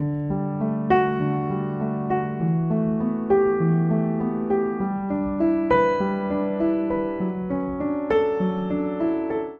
Ostinato Retrospection D Major 100 bpm, Upright Piano; Upright Piano by Spitfire Audio; Ableton; Factory Packs